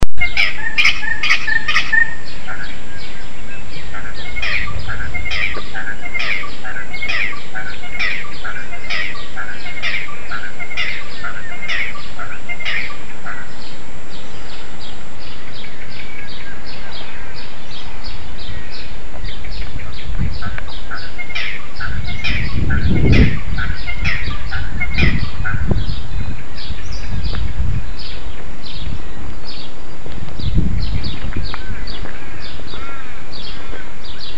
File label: birdcall, Australia; 2010 Australia Trip